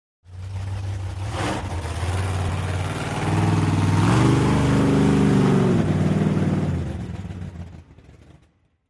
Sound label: Monster Trucks; Revving By, Monster Truck Revving, Races By Thru The Obstacle Course; Shot Cu From The Obstacle Course.